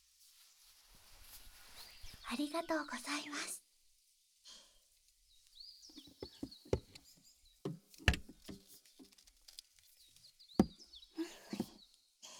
散髪 シャンプー; 裏庭散髪

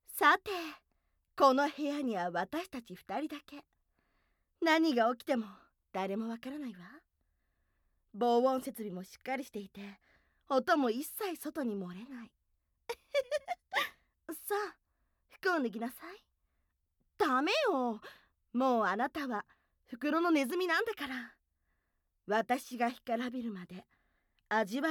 耳舐めパート

